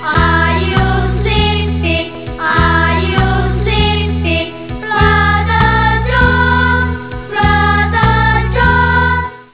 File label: children